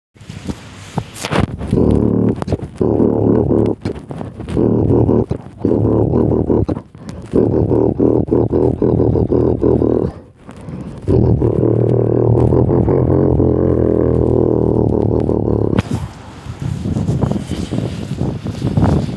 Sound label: breath of my heart bass maximum